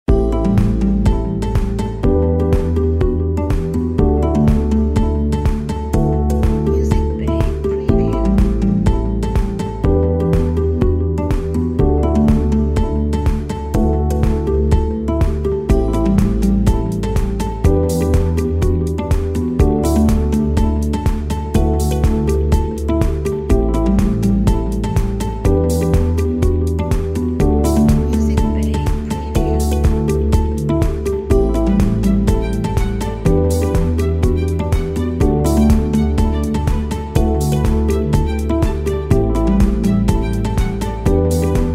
Guitar Motivation

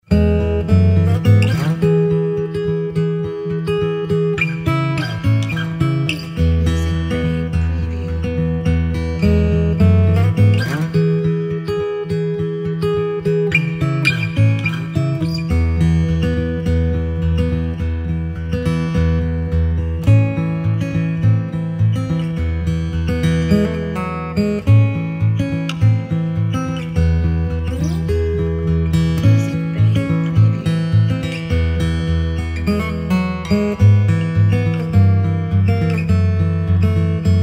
Acoustic Guitar Folk Background

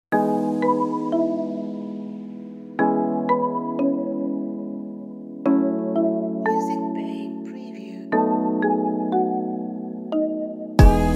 Feel Good Vlog Hip Hop

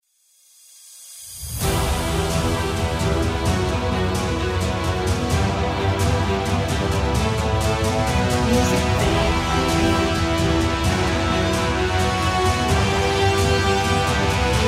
Cinematic Dramatic Epic Intro

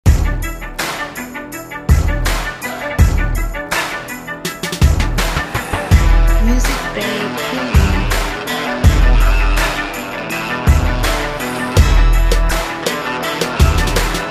Urban Action Advertising Background

